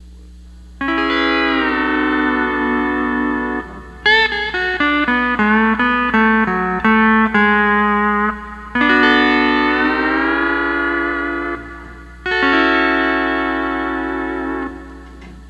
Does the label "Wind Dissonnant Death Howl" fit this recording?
No